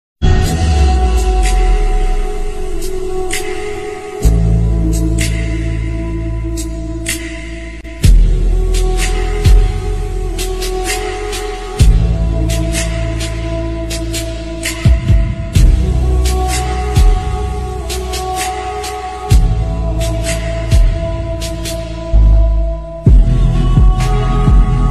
sad ringtone sad music ringtone ringtone sad ringtone crying